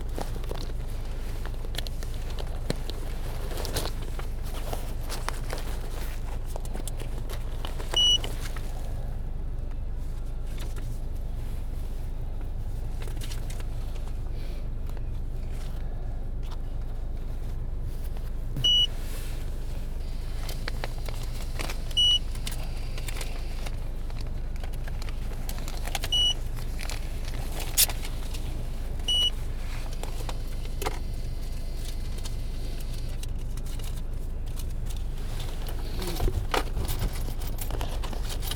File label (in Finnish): taksin korttikone